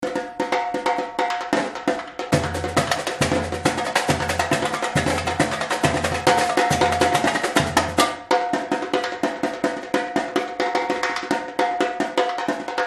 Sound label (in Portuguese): repinique